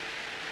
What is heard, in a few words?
reel Spin; wolfcub